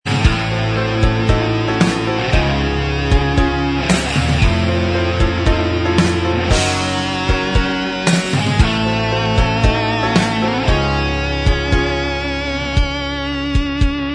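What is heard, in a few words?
instrumental, backing tracks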